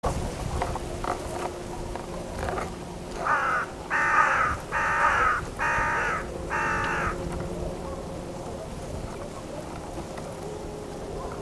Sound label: crow; birds in the bible